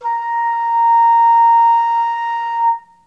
B5 baroque, flute

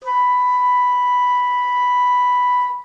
C6 baroque, flute

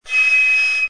D sharp 7, flute; modern

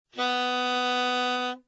C sharp 5 alt, saxophone tenor

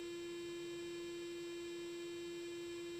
G4 normal, Clarinet

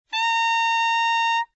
C7 alt, saxophone tenor

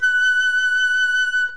F sharp 6, flute; modern

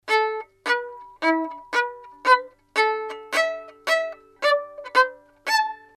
colle, articulation

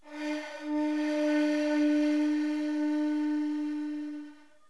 shakuhachi, flute; ro kari; played by Riley Lee

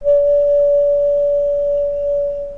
D5 alt, flute; modern

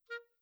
staccato, clarinet articulation

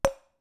bellplate corner; bellplates

